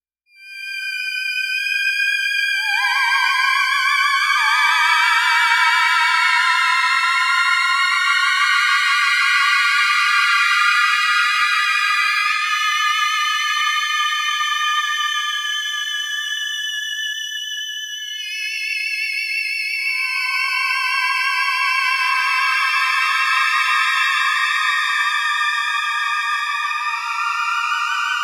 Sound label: Higher register sustained wails, woven into each other, Miniature Theremin; ringtones